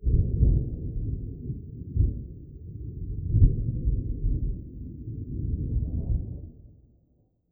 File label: Low Thunder Clap; Mid-Side